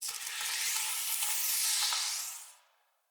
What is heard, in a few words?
Arm Activate; Weapons; Arms; Ode Arm